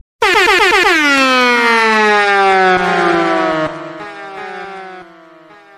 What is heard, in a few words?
Tripple Horn Motion; DJ Effect